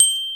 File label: orch Bel G6; Digital Sound Factory Virtuoso